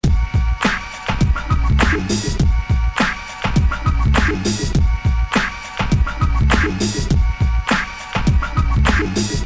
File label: hiphop; nickelodeon; nick-dance-machine